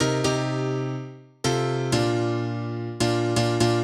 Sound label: House Piano (125BPM), retro house